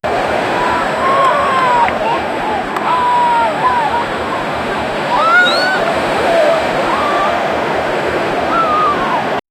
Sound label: sound of small crowd on, chatting